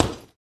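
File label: Boxing Bag Hit Short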